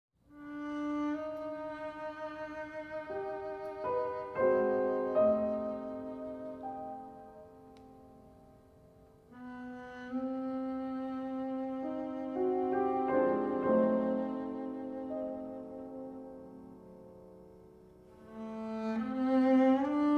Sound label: Sonata for Cello and Piano - Lento-Allegro